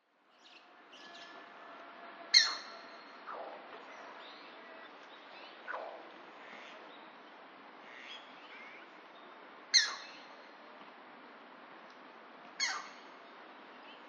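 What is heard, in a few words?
striated heron, utsunomiya; ササゴイ; 栃木県宇都宮; 平野敏明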